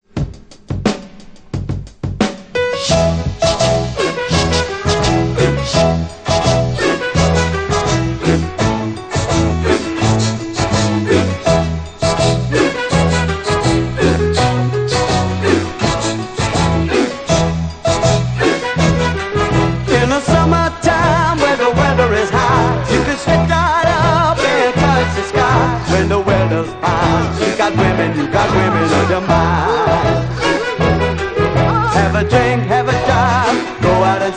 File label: Singing Explosion